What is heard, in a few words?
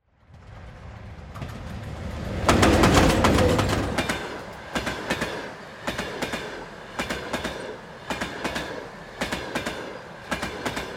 Train